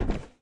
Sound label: Body Collision; Lethal Company